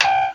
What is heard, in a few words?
keypress